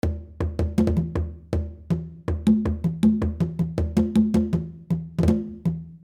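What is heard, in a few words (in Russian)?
конга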